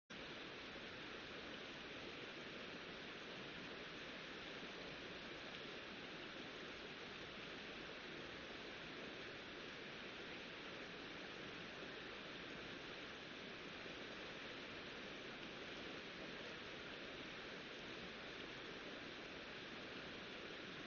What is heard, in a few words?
riverside; Kyoto U; Ashiu